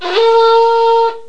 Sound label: horn